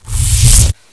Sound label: zoom in; predator